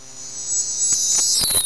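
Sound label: phase invert; HIGHTECH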